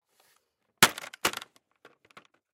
Hockey Helmet Drop, Hockey Helmet Take Off Drop Cement; SPORTS; SoundEffects-Blastwave